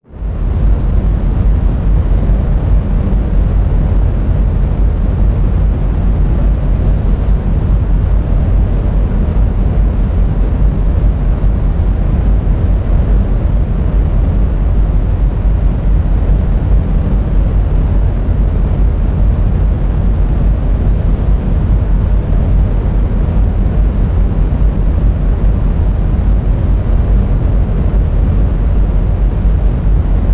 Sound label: rumble; Bluezone Corporation - KOSMONAUT - CINEMATIC